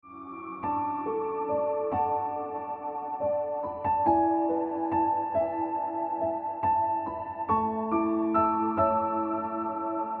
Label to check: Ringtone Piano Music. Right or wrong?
Right